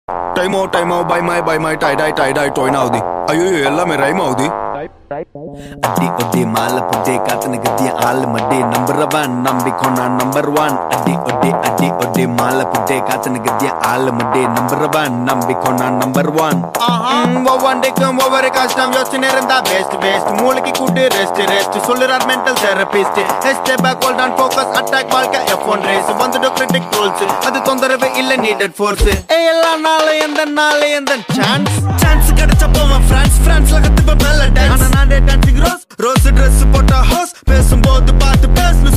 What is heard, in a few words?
bgm ringtone tamil ringtone